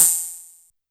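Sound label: Hi-Hat Open Synth; YAMAHA RM1X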